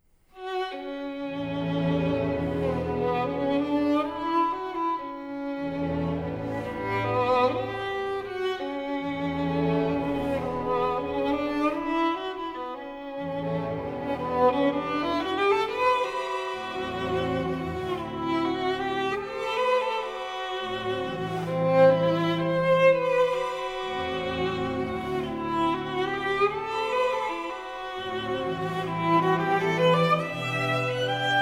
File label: Fantasy for Violin